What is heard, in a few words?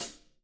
STK FOOT, SD HATS, Living Drums; Sampleheads; Peter Erskine Living Drums